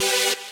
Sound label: saw